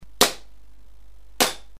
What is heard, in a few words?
standard, maruzen p99